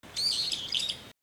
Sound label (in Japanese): hiyodori